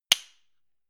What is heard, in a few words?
click wood generic